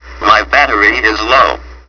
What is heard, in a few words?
battery; knight rider